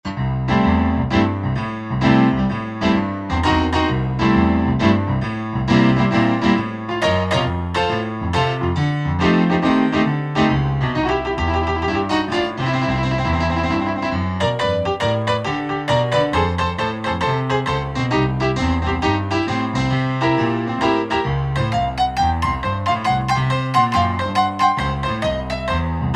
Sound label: Upright Pno, Roland JV-2080